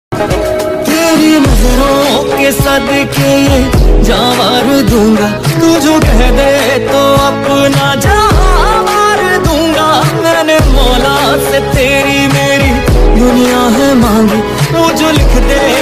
song ringtone; ruposh movie

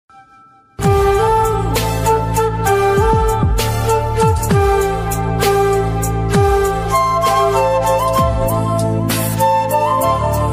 song dj remix ringtone

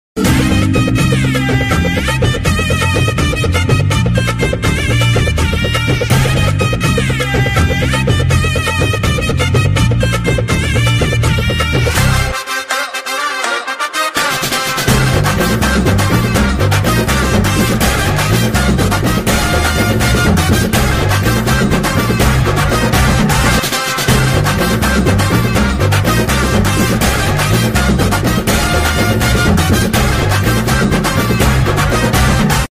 Tamil bgm ringtone

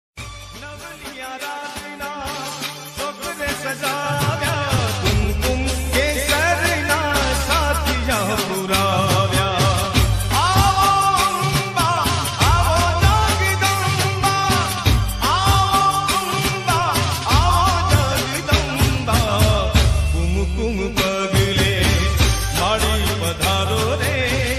navratri garba Gujarati ringtone

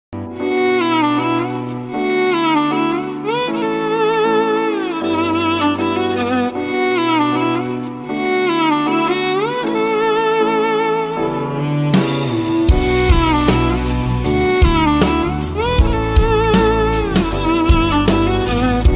bgm ringtone sad love song

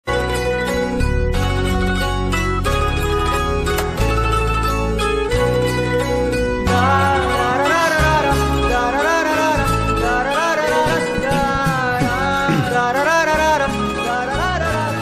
love bgm, Telugu; agnyaathavaasi movie bgm

